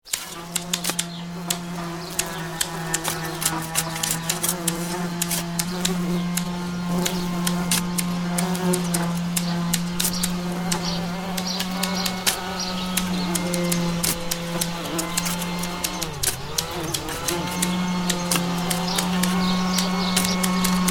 Busy summer garden activity digging, mowing and trimming.; Outdoors; Backgrounds; Busy Gardening